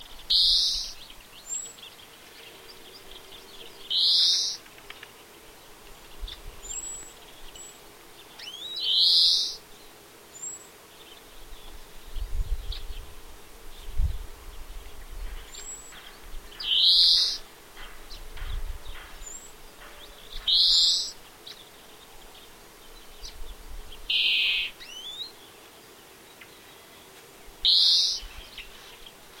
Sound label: Greenfinch